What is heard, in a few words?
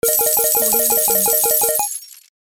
slot machine win coins